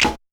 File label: snap with can snare